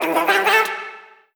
Vocal Sample Pack Phrases Am; Slate Digital Omnivox Vocal Sample Pack

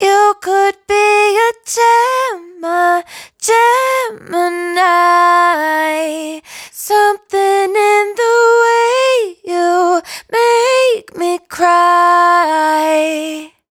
Dry Vocals hook Gemini 140 Ab; Raw Vocals; Slate Digital Omnivox Vocal Sample Pack

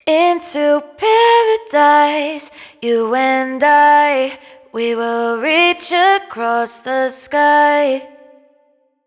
hook Into Paradise 128 Gm wet, Vocal; Slate Digital Omnivox Vocal Sample Pack

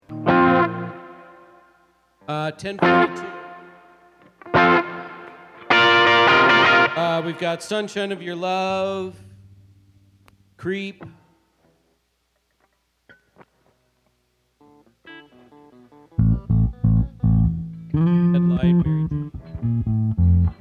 Strings Emphasis

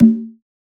perc toy conga; Drum One Shots; SD MIDNIGHT Sample Pack; Slate

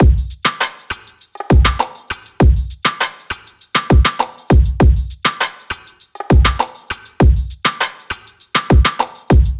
drum loop 100, Pop; Drums; Full Drum Loops; Slate; SD PASADENA Pop Sample Pack

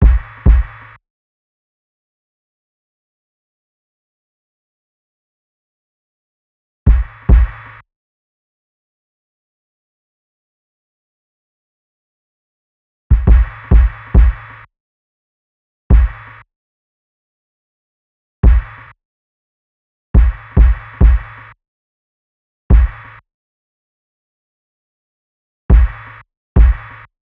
kick 70, Kick Loops; Drum Sample Pack; SD SEISMIC; Slate

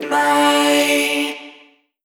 Vocal one shot D

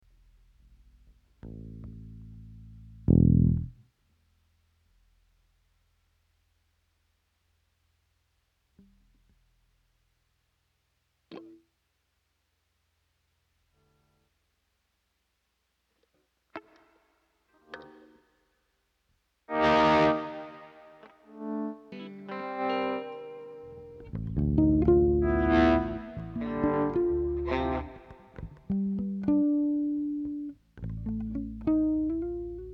Strings

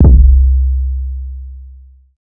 808 one shot C; Slate Digital BASSHOLE Sample Pack

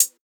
hat pots, Closed Hat; Hip-Hop; Drum One Shots; SD CAICOS Hip-Hop Sample Pack; Slate

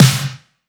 Snare Grimey, 909 Snares; Slate; Vintage Drum Machines Volume 3 909 Edition

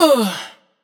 Ugh Chop wet, adlib; Slate Digital Omnivox Vocal Sample Pack; Adlibs

